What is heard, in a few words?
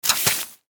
Science Fiction Spider Web Shoot Short Super Power; Aliens